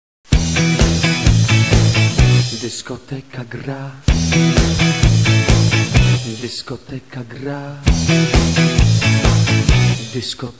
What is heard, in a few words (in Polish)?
Dyskoteka; jail nuke